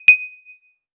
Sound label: short single pickup sfx, arcade